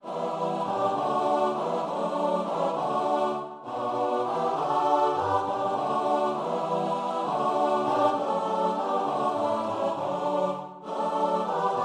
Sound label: birthday of the lord, choir